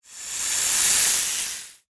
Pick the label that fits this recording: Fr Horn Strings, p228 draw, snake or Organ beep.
snake